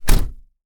closing car door

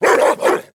big dog barking

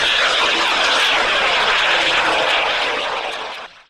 missile launching

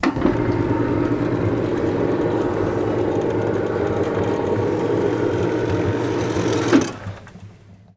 automatic curtains opening

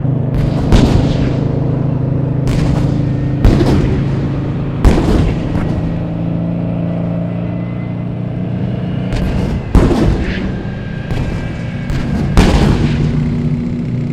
battling tanks

